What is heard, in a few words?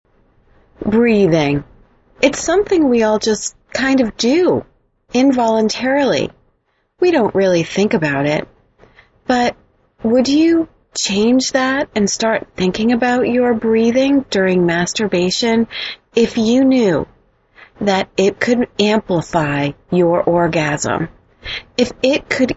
Orgasmic Breathing; Kink Classroom